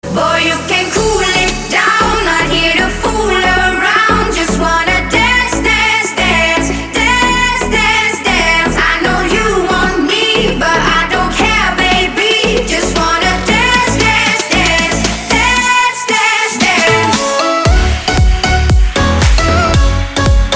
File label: solo dance